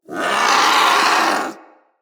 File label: Vocal Alerted, Hunting Critters; Characters; Vulpaphyla